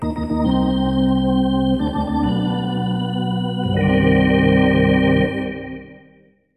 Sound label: Hockey Organ